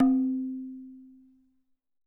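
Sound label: TABLA right; WorldSounds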